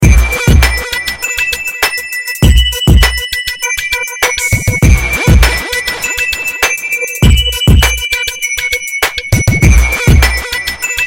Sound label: Hip Hop Bells N Beat